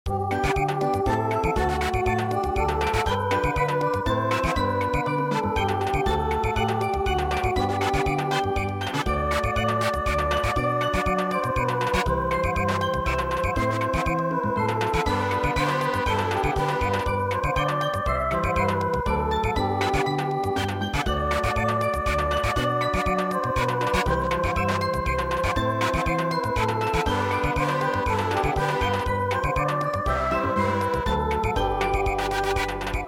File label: Samba; god save the queen